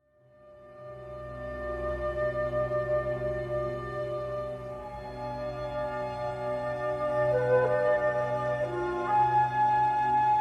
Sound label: Baroque Music